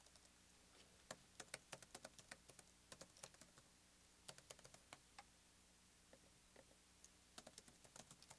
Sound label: SM62 Foley